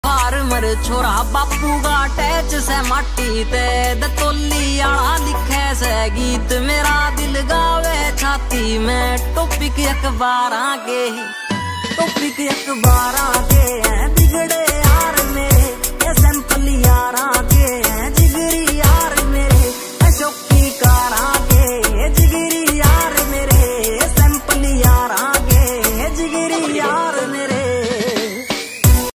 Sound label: haryanvi ringtone